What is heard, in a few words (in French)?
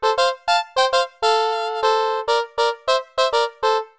section zouk; arturia